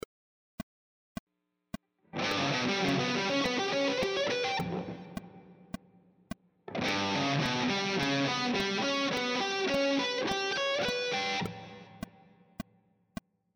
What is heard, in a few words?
triad arpeggio sequences, guitar